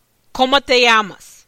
ringtone; ms mcneil

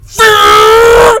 voom

